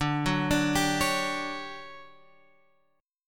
D Major 11th, chord

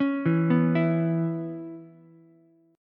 C sharp Minor 7th C sharp Ukulele; C#m7 arpeggiated; JGuitar

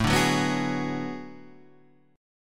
B Minor 6th A; Bm6/A strummed; JGuitar

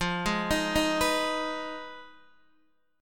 F Minor 7th Flat 5th, Guitar, Open D

